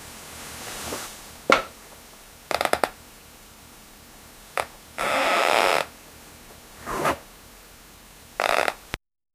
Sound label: bed creaking rapidly